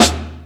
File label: Dirty Snare, Snare One-Shots; Drum n Bass; KJ Sawka; Drum n Bass & Beyond; Drum Tool Box